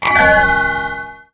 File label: enter; cartooncity